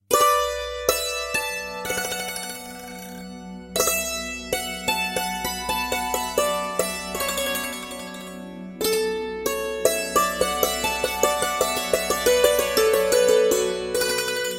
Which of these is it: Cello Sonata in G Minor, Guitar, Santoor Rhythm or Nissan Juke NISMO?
Santoor Rhythm